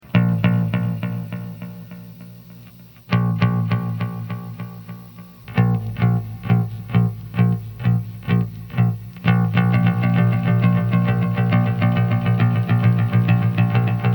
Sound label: Delay Test